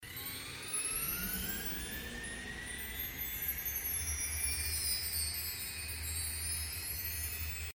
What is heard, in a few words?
spin; wulingzhu